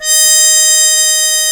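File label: D#5, mute trumpet; AKAI S-Series CD-ROM Sound Library VOL-2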